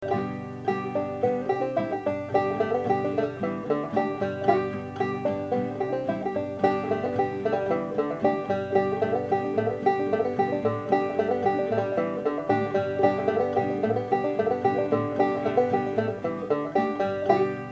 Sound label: Bluegrass Banjo Lead